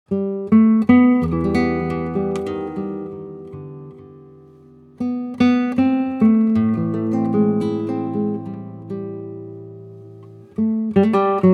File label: nyl solo